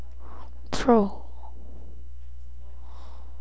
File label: throw